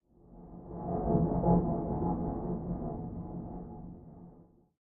Ship Turbulence

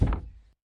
Cupboard Close; Lethal Company

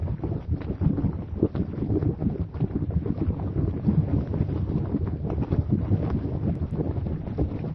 slime angry; Lethal Company

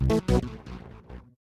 Reward Good

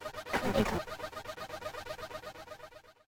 Critical Injury

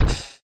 jetpack broken

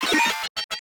Mine Trigger; Lethal Company

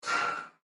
Breaker Box Open

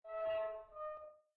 Inside Ship Creak

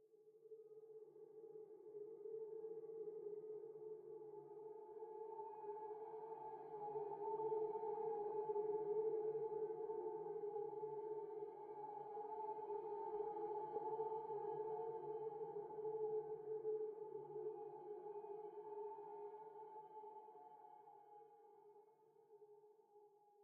Droning

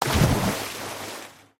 Fall In Water; Lethal Company

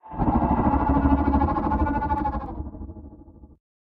Sand Worm Roar

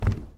Wood

